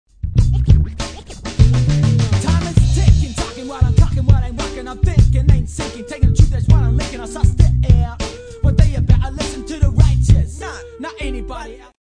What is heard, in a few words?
Music In Style